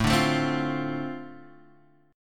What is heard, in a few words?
D-Minor 7th A, chord